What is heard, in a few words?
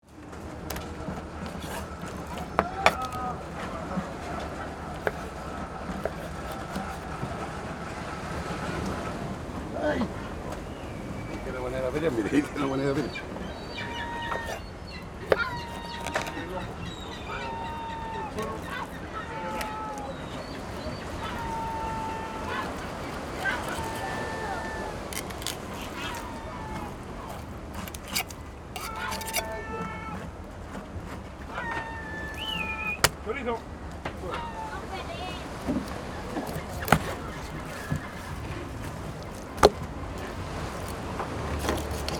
Seafood fish market ambience seagulls